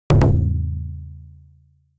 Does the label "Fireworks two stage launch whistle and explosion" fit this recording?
No